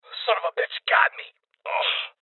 Injury Male

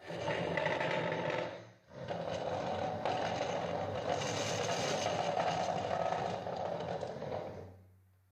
Chair Moving

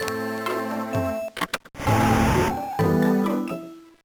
Vroom

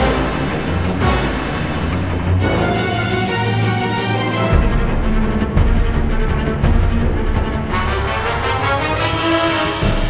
Music Combat; game rip; Legend of the Guardians The Owls of Ga'Hoole; nds

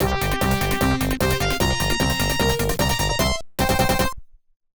Victory I (Clear); Hippodrome; arcade

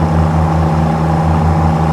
Bmw M2 Low; car simulator 2

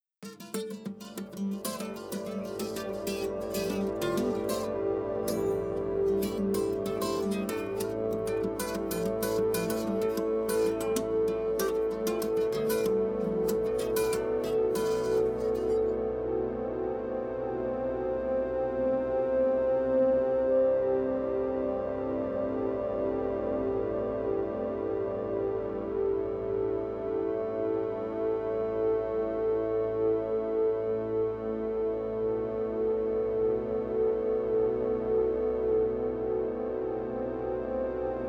Ruins Pads

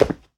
Jump Tile Land

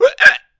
Pig Freeze; Angry Birds Space

